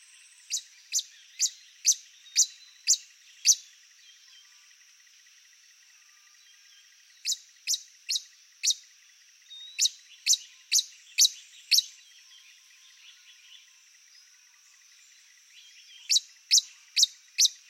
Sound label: Colibri delphinae Colibrí pardo